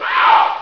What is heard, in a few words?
controller pain; Source Engine; bshift